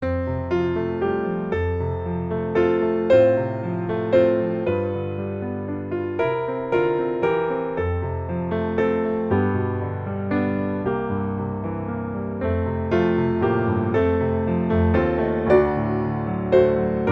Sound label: piano